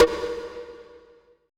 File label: ETH DRUM